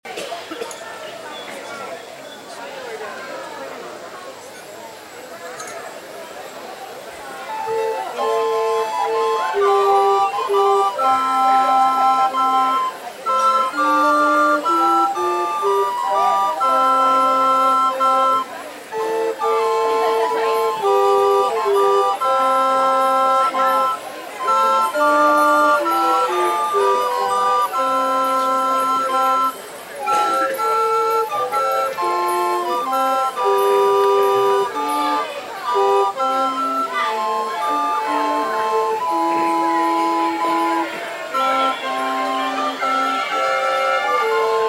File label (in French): flutes a bec